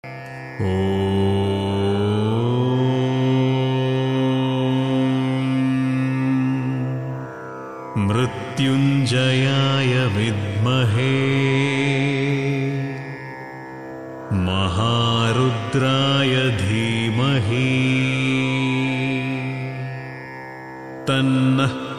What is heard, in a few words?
mrityunjaya gayatri male